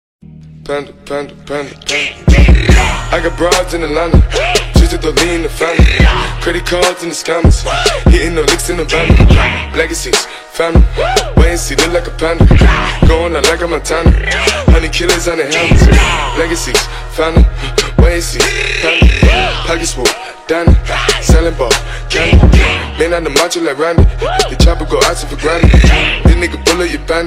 ringtone bgm ringtone english ringtone english song ringtone